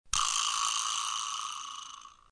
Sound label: vibraslap